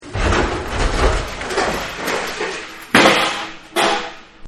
Wood Splinter Metal Clang; Wood Splinter Crunch; Wood Sound Effects; Materials

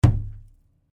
Cardboard box hit; Material components for constructing more complex sounds; Materials

